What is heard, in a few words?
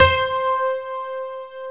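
grand piano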